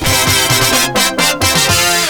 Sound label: fanfare